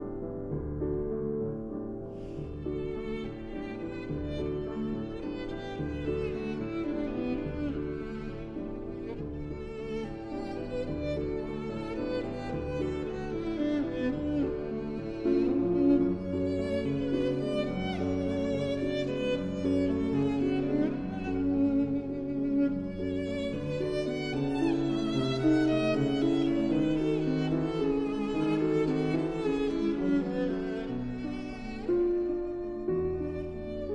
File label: Violin and Piano in D